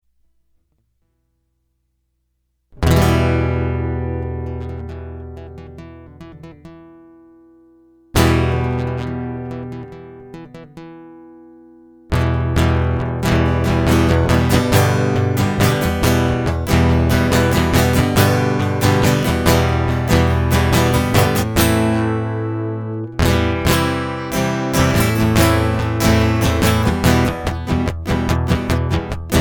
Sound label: Guitar Loop